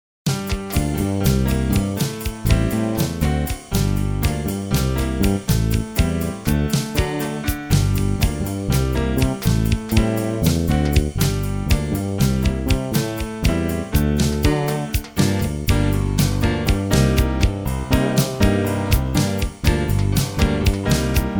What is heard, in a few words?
G Minor Latin Thing